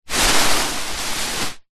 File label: household beanbag small sit on